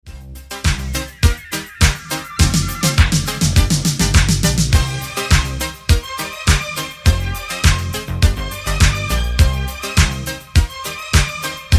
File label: piano fantasia